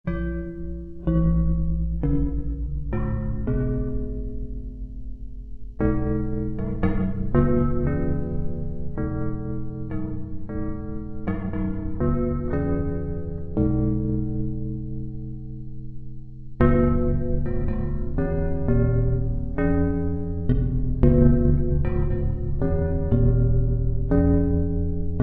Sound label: upright bong